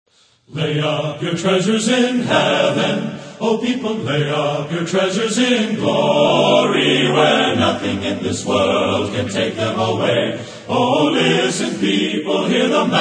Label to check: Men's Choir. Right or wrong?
Right